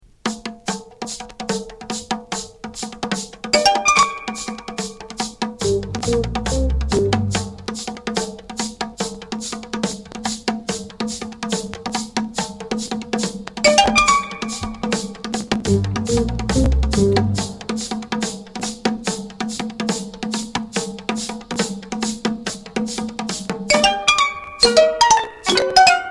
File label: afro samba